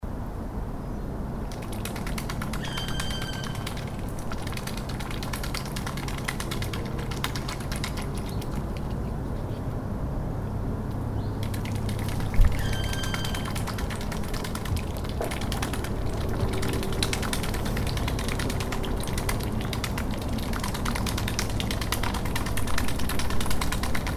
swan feeding swan swamp forest swan splashing birds street; tascam dr100u